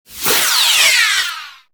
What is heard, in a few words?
hand phaser